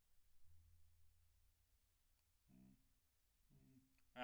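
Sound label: Snare Top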